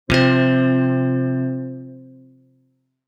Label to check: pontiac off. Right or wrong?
Wrong